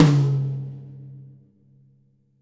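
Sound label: Jazz kit tom, Drums Acoustic; USB Soundscan vol.10; AKAI; JAZZKIT 2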